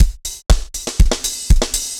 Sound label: dancefloor tight 120